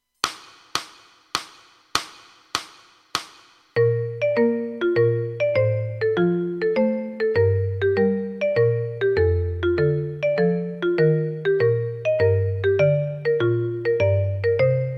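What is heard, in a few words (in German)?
Etüde in B-Dur, Stücke mit 4 Schlegeln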